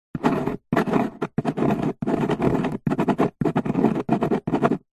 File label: office handwriting pen write on cardboard box